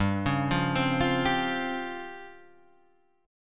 C sharp Major 7th Flat 5th, chord

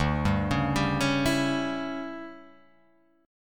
D Major 7th Suspended 2nd Suspended 4th, chord

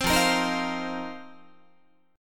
F 7th Suspended 4th F down Guitar; strummed

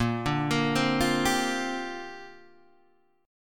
Bb 6th Add 9th Bb Guitar DADGAD

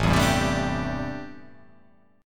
A Minor 11th A, Chord

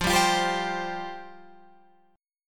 F Suspended 2nd F Guitar Open F; Fsus2; strummed

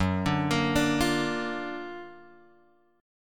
F sharp Augmented Major 7th F sharp; F#+M7 Chord {2 X 0 3 3 1} arpeggiated

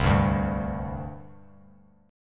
C Suspended 2nd, C, down, Bass Standard; Csus2 strummed

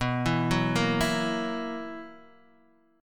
B Major 7th Suspended 4th B down Guitar Open D

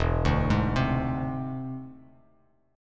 E Augmented 7th E down Bass Standard; arpeggiated

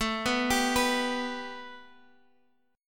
A 7th Suspended 2nd Guitar Standard; 17,14,17,16,x,x; A7sus2 arpeggiated; capo=3

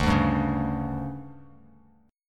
D Major 7th Suspended 4th, D, down, Bass Standard; DM7sus4; strummed; JGuitar

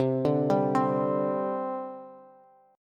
C Suspended 2nd, C, down, Bass; Csus2 arpeggiated

